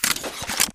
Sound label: grenade refill; burncard; Titanfall 2